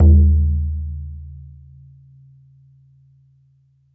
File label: BODHRAN, Celtic Percussion